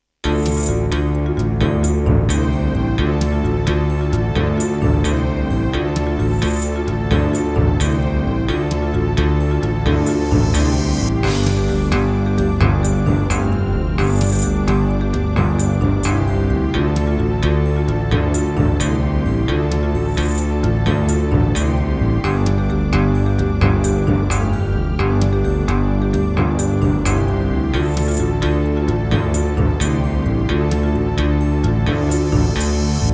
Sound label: Yamaha MU2000EX XG MODE, wavetable; Heretic